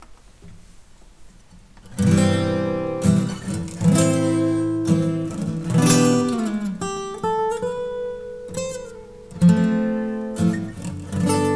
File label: Guitar